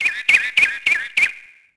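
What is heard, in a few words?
ambience bird; Tony Hawk's Project 8; XBOX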